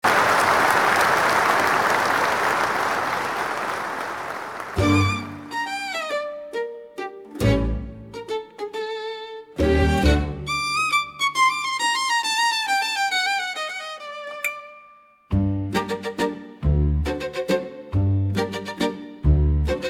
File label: Trump Waltz